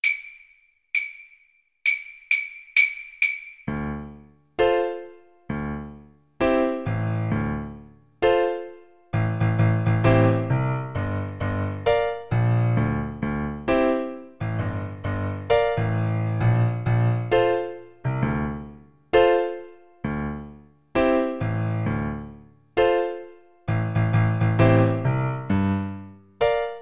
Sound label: hip hop house, bassoon